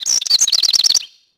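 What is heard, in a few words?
ambience bird; Tony Hawk's Project 8; XBOX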